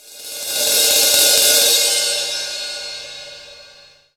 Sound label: CYM SIZZLE, cymbals; Roland LA; CYMBALS TAMS